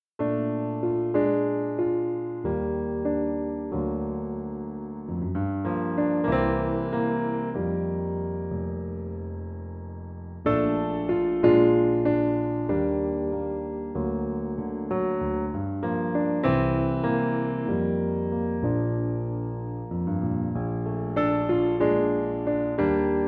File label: Solo Piano